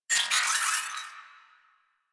Corpus Space Drone C Pain, Enemies; Warframe

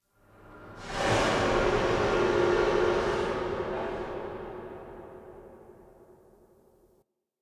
Steam Blast C; Warframe; Ambience; Spawn Rooms

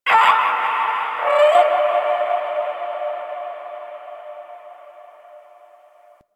Venus Landscape Distant Creatures; One Offs; Zone Two; Warframe; Venus Open World

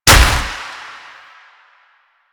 Proc Explosion; Mods

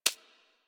Bird Of Prey Feed Ground; Warframe

